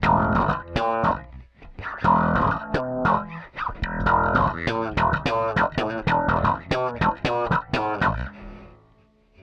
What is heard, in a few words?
couplet ending bass slap